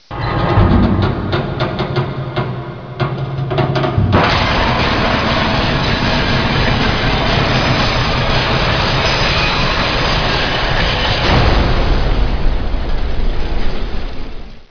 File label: elevator fall